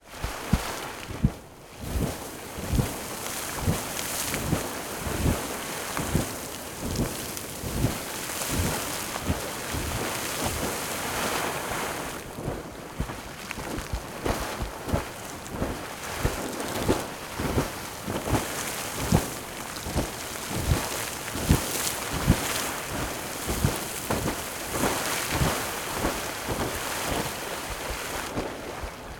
WATER SPLASH - Sawmill Geyser Errupt, Yellowstone Geysers; Game Audio Factory; YNP